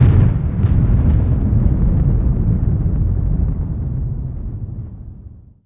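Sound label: thunder far; The Elder Scrolls III - Morrowind; Morrowind GOTY GOG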